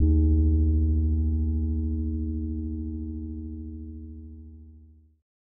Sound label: Moog Gong Long Eb